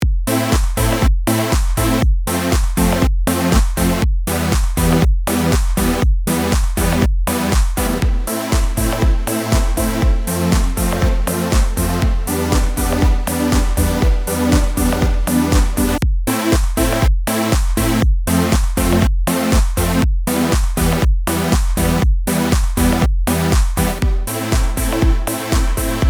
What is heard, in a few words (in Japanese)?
EDM系